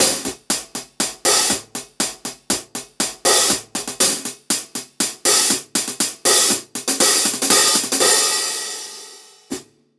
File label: hihat